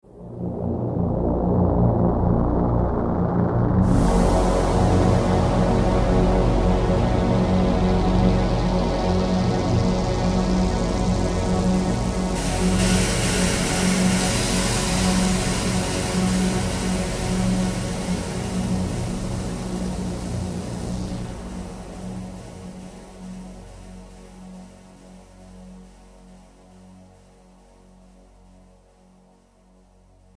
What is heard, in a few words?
slow fade stinger